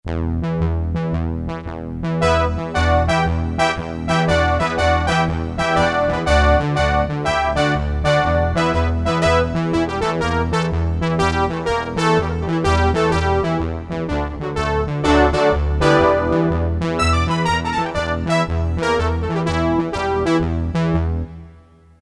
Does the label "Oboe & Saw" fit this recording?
Yes